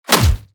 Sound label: Punch Full Impact